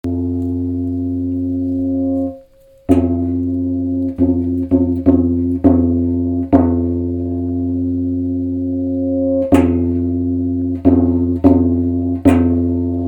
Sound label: Panaw gong